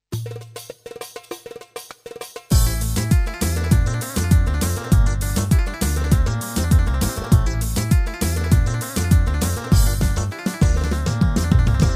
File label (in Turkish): oyun, Yamaha PSR A300TR V2